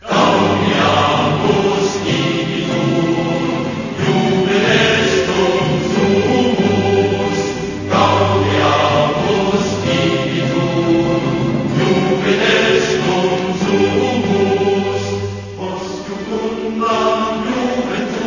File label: Gaudeamus igitur Vocal